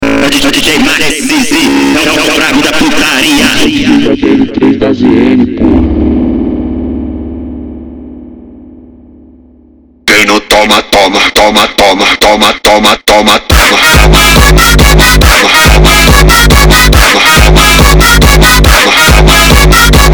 beeper funk

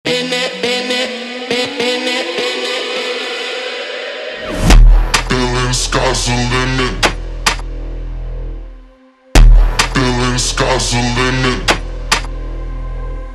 Brazilian Phonk super slowed

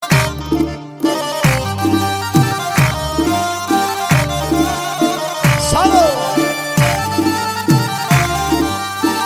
Saz Saz Dang; ترکی

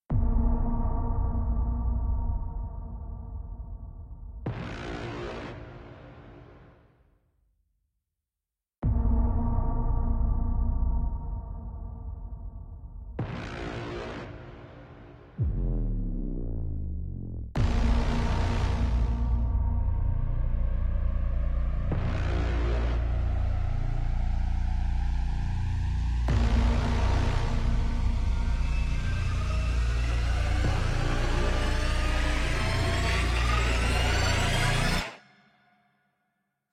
Horror Music

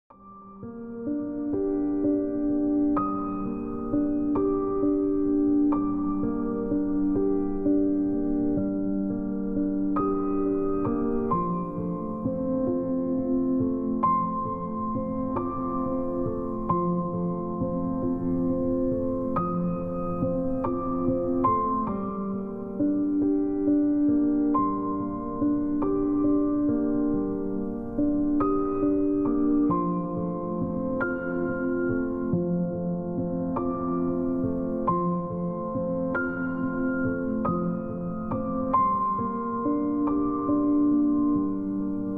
Relaxing Musics

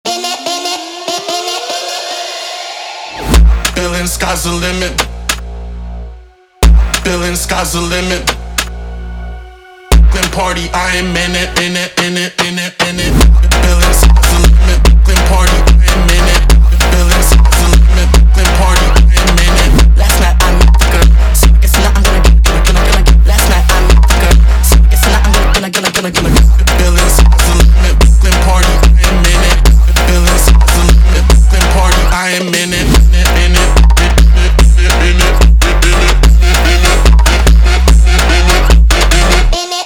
Brazilian Phonk Sped Up Instrumental

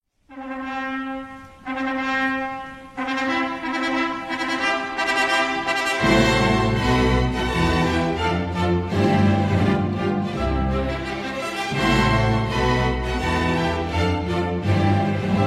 wedding music; ورود عروس داماد

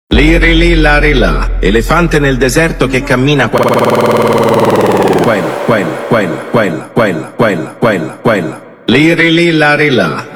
FUNK

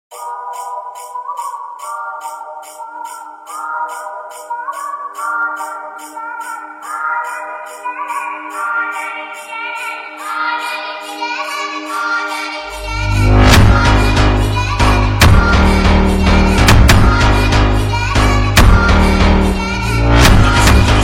Funk Speed up

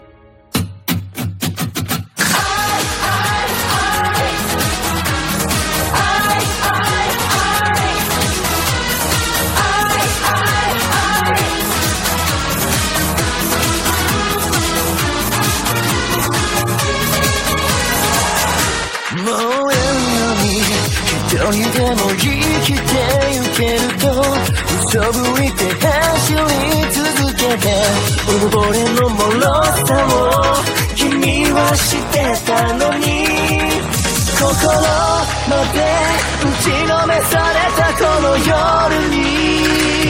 Japanese Music

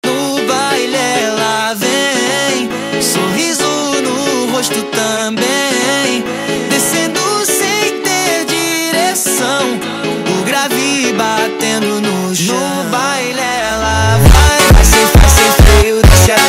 Funk Music

